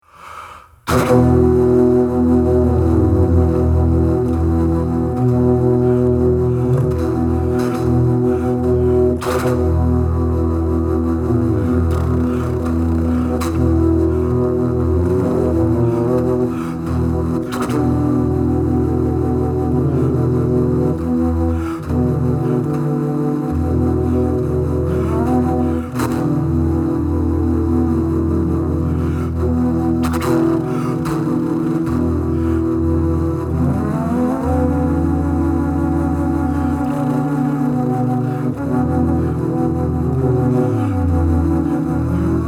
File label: Singing Wall, contrabass flute; short